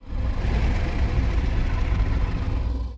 creature ice worm idle exhale

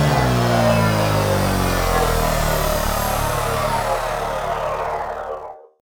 Power off

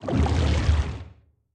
creature brute shark swim slow

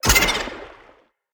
creature trivalve flinch swim

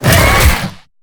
creature chelicerate bite

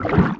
creature penguin swim

